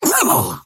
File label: pain big